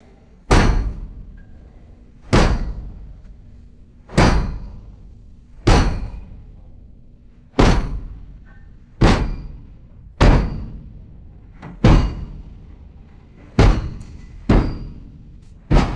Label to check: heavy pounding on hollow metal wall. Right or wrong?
Right